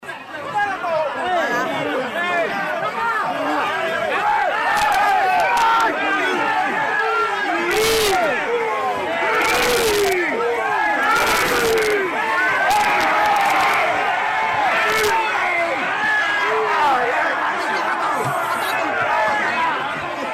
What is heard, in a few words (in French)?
son ambiance porte cassee